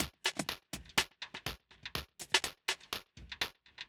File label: 123BPM Top Loop